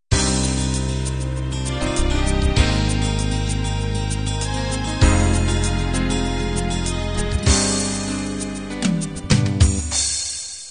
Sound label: home beat intro